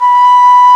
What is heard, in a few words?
NYEFLUTE B3; E-MU Formula 4000 Series Vol. 5; Protozoa; Proteus3 Presets